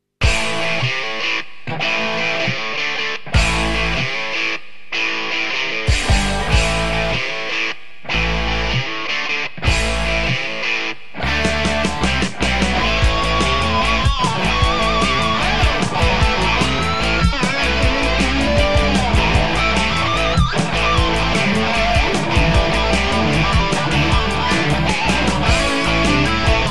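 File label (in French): Une ambiance trés Rock pour ce BT au quel j'ai rajouté deux guitares rythmiques, une basse et un solo "big rock". (Strat SRV); Backing track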